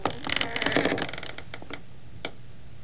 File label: creak; svencoop; keenhalloween